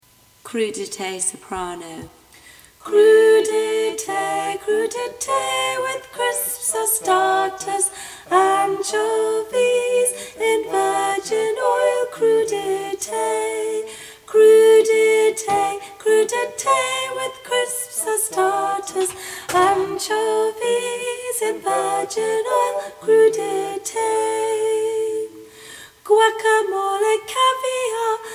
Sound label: Crudites soprano